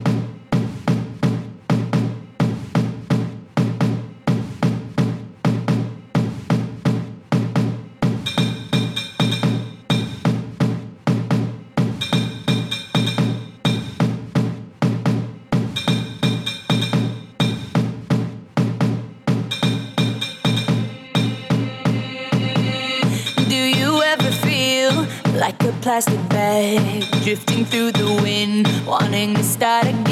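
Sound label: Melbourne Bounce 128 bpm; remixplanet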